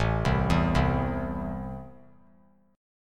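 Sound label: A Minor, chord strum; 9,7,7,10; JGuitar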